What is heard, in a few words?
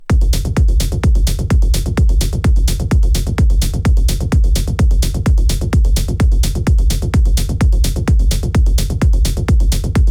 Techno